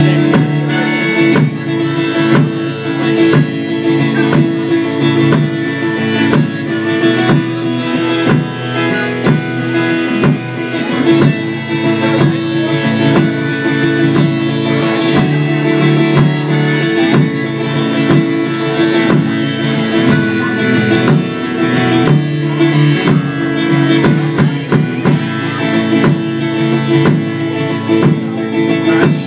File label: great local artist now playing at the party acoustic